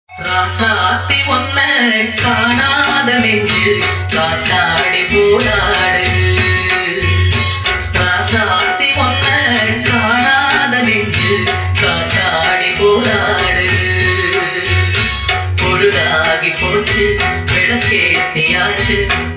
tamil songs ringtones love bgm ringtone tamil love ringtone